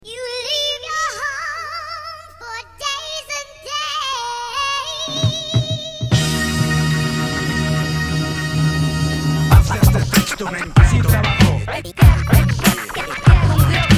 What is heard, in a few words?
Macedonia funk